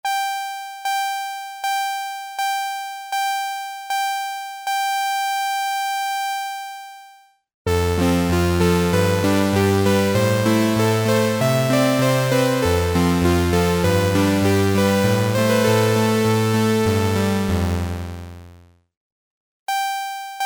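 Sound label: From 1 to 3 oscillators, Memorymoog Classic Analog Polyphonic Synthesizer; Paolo's tweaks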